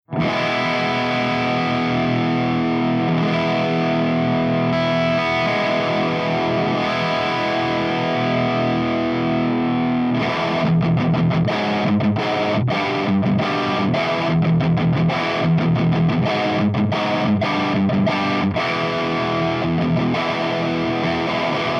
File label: MESA STUDIO DRIVE V30 P90